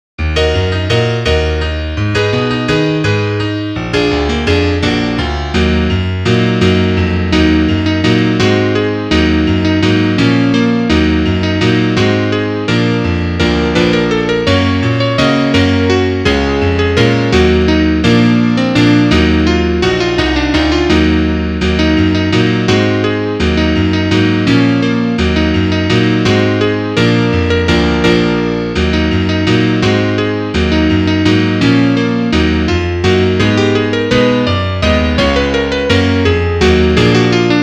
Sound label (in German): Klavier Solo, trompete; Rhythmische Studie